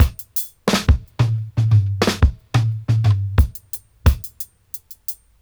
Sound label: 88 FILL, Dub, DRUM LOOPS, 088 BPM; Drumdrops In Dub VOL-1